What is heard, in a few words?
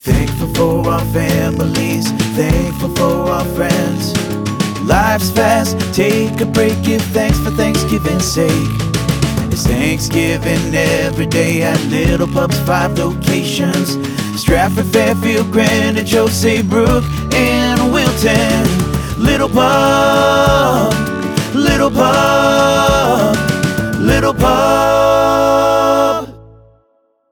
jingle Thanksgiving; Little Pub